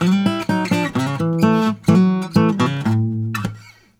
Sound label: Blues Guitar; ILIO; Fretworks; LICK